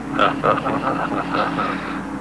laugh

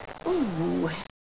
oooooh; mink

